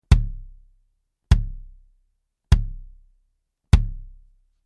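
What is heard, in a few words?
BASS Retro Screen Reso Hard Plastic, bass drum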